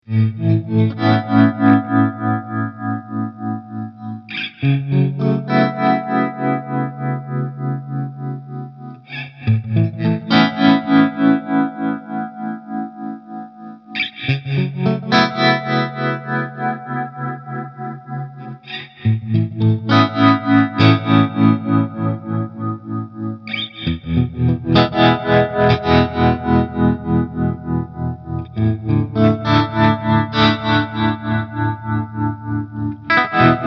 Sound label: Tremolo Hall Sinussy; BIAS